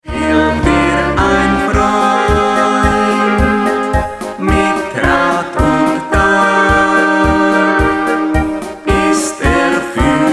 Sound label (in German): Polka